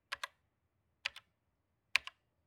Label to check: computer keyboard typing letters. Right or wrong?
Right